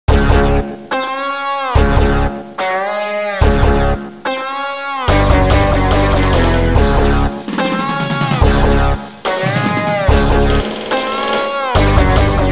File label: Battle